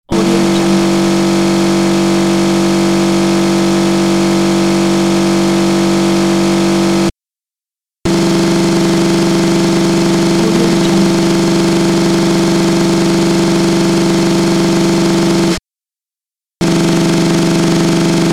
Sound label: loud drill sound